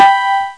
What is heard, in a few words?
Bonus Ting; Zone Warrior